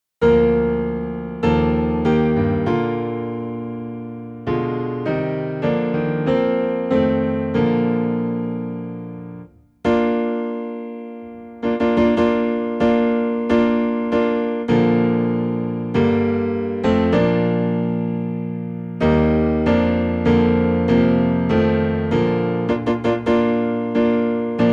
Piano Low in B Flat; God of our Fathers

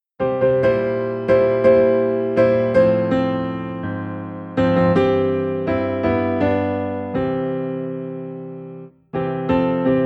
Piano - High in C; His Eye Is On the Sparrow

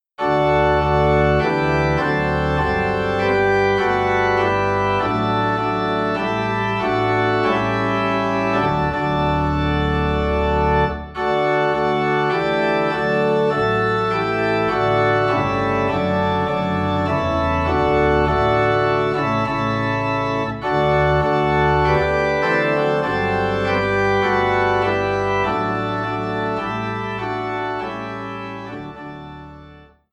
Organ Low in D; Joyful Joyful We Adore Thee

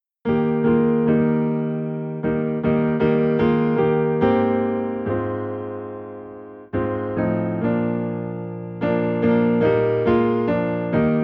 Piano in E Flat

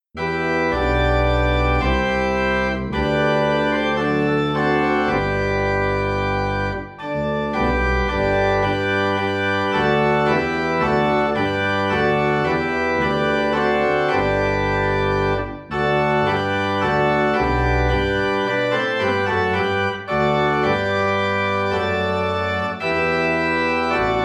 Organ in G; All Hail the Power

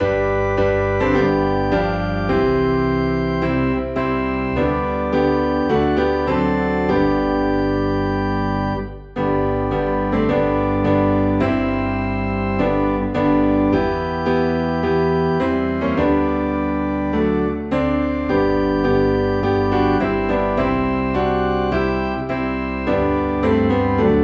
Piano and Organ Low in G; Tell me the Old Old Story

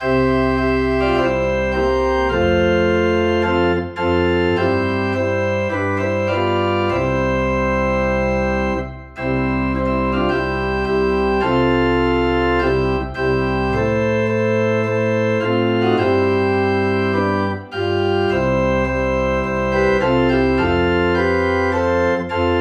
Organ in C; Tell me the Old Old Story

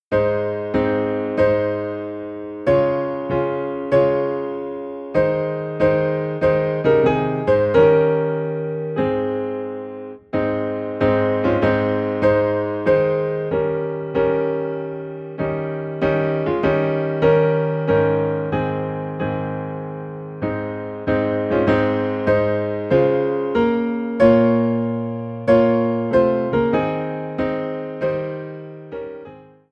Piano in A Flat

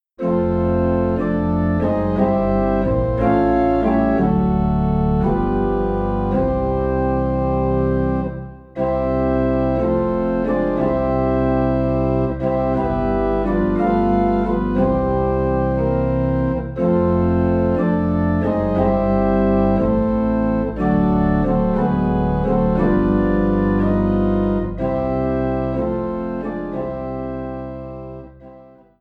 Organ Low in C

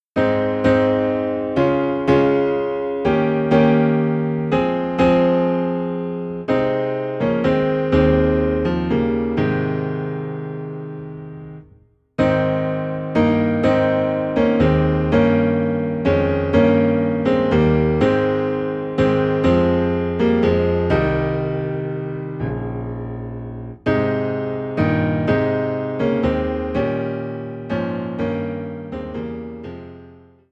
Piano Low in A Flat; christmas; In a Cave

